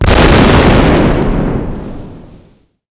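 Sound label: exp large generic, explosion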